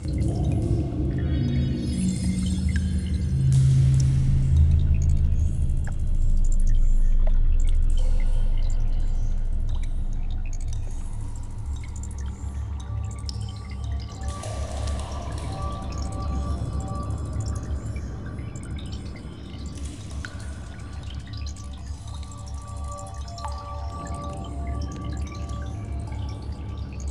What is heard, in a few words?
Ambient The Crypts; rayman arena